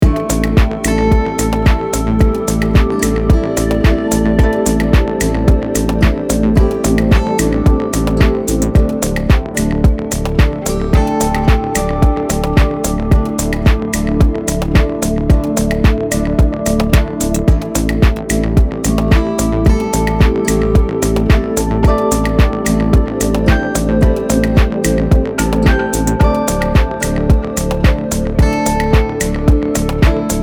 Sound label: 110BPM; Mictron